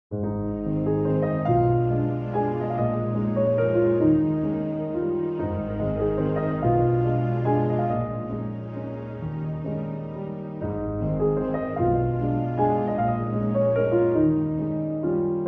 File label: With Strings